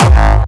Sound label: early hardstyle g